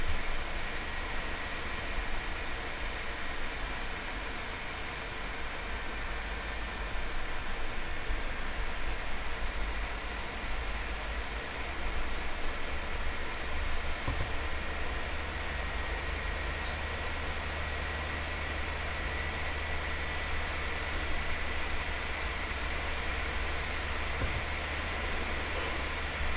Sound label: White Noise